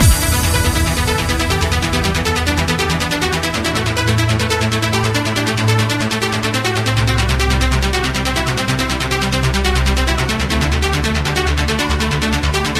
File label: trance track; svencoop; keenhalloween